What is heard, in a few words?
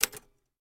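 Typewriter Single Key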